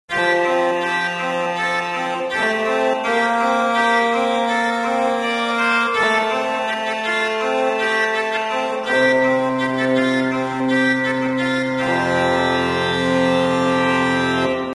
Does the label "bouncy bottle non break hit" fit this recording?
No